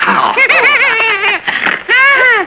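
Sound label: curly laugh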